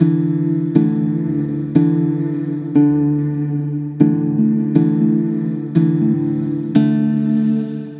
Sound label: Musical Loop 120 F Minor; Invisible; Ghosthack